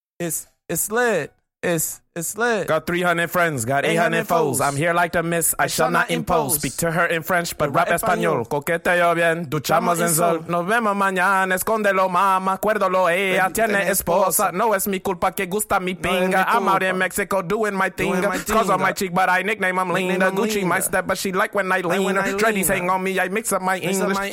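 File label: hip hop, B major, 100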